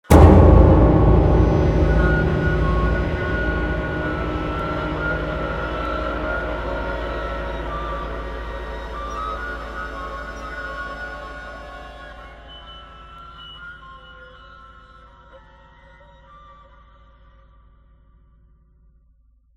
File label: Short Music Scary; Solus Project